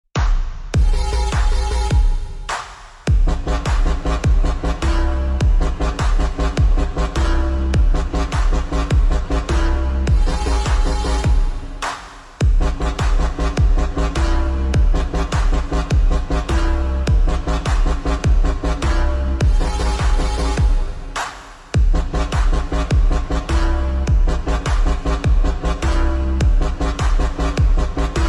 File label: Slowed Reverb; animal